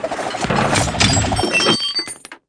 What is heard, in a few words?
Operate Start; SFX Game Operate Start